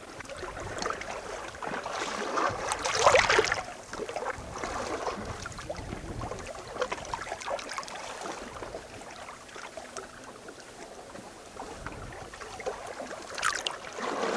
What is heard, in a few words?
mono water